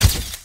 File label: flash